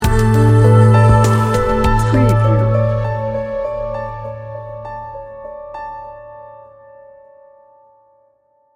delicate dreams sting bumper

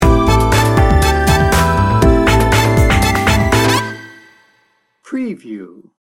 cool energy sting bumper

